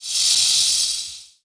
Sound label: Power Up; outlaws